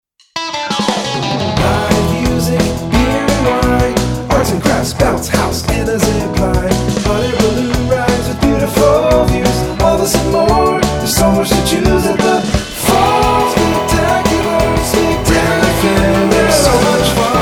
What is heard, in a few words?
Jingle; Fall Spectacular